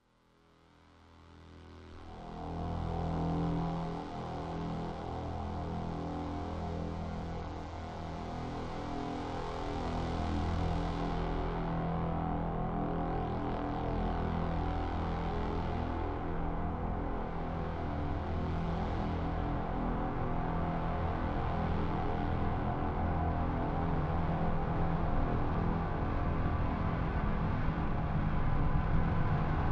Drones

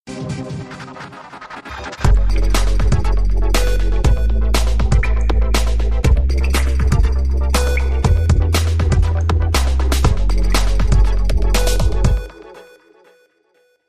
Beeps

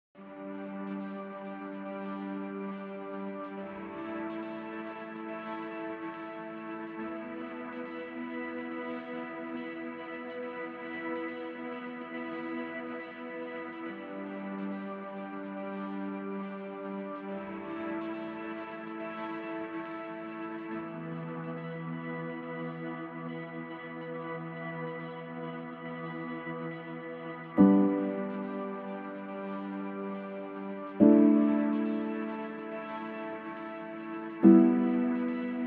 Piano and Synth

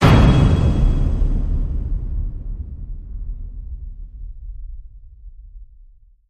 Hit Heavy Metals

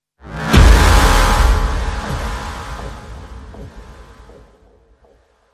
FX Hit, Sting

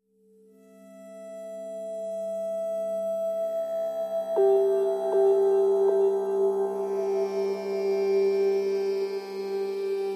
Ambient Mix

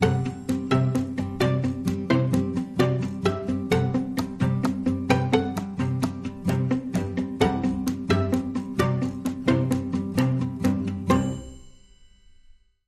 Clock Is Ticking, Bumper

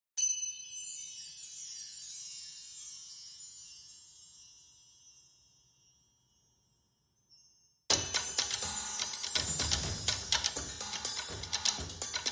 Drums Only

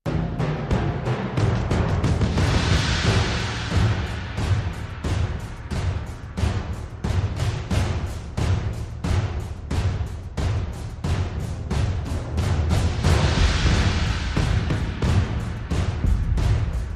Percussion Only

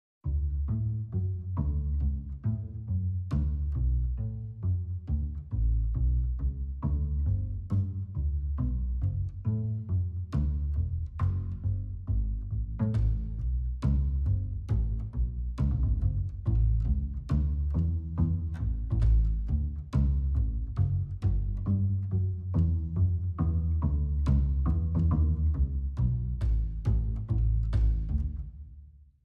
Bass Clean

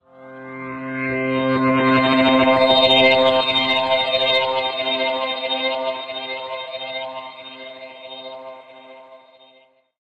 Orbital Pulse

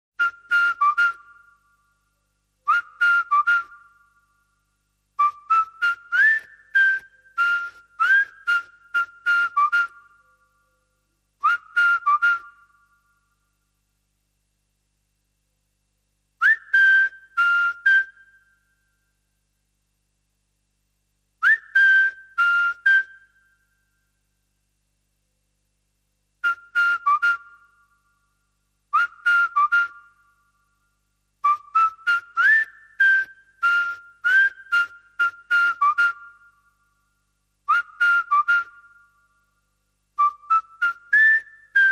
Whistle Only

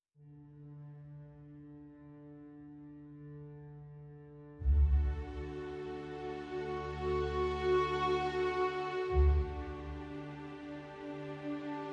Strings Only

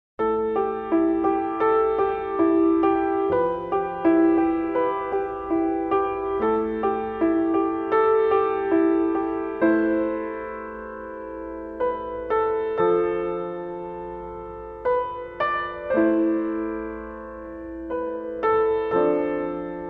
Requiem, Contemporary Solo Piano

